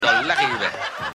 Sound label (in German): lachen